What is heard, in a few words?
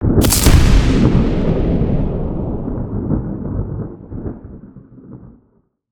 lightning strike; psfx; 3rd level spells; call lightning